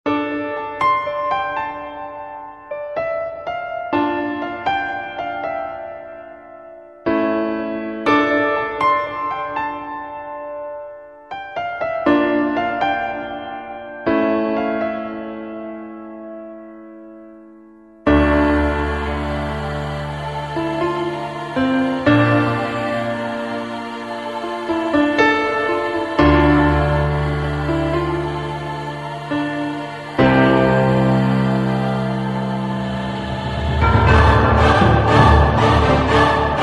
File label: ice and snow